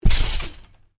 Trampoline kneedrop, Trampoline, bounce on: kneedrop on canvas trampoline; Miscellaneous Sport; Sports